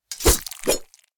Knight sword hit stabbing in and out

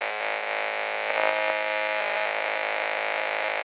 Walkie talkie static

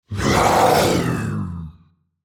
Demon king lord attack shout

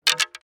Ice hockey sticks handling

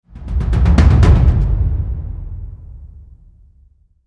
Tribal drum impact cinematic hit

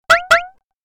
Cartoon eye blink

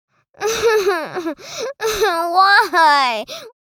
Young girl crying desperately

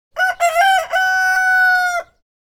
Rooster crowing

